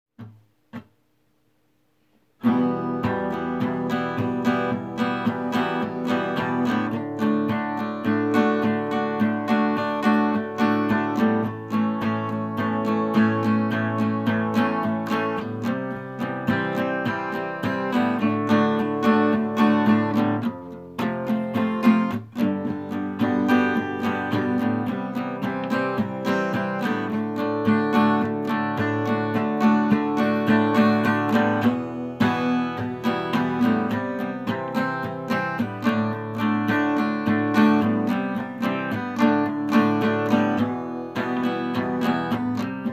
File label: acoustic; brick and mortar; bibis house of kabob